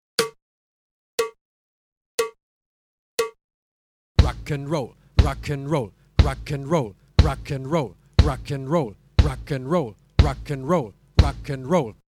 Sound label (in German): Uebung, schlagzeug